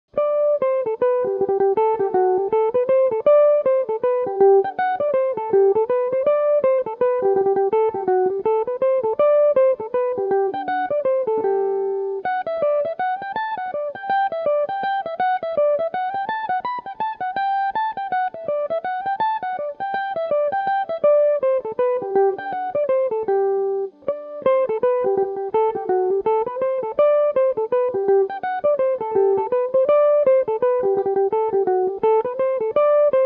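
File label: reel, G major, mandolin; Our House At Home; Belmuse EM-190 electric mandolin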